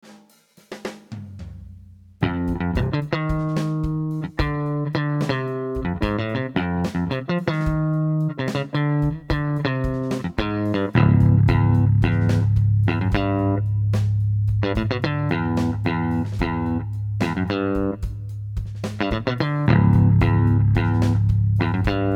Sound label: vision bass